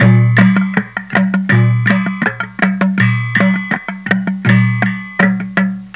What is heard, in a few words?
exotic